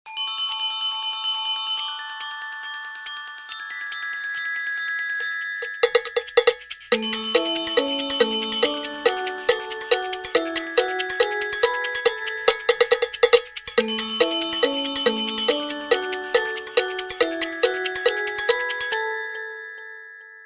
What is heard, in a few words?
Treble, ringtones; Tecno Spark Go 2022